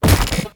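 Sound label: attack punch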